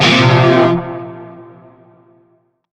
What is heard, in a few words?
Shawzin Hirajoshi Chord; Warframe; Narmer Shawzin